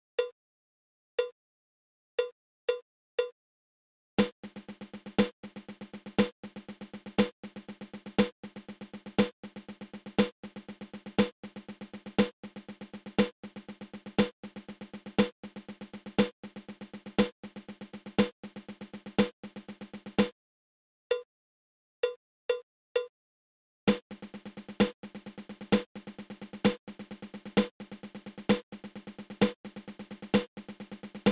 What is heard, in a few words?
Seven Stroke Roll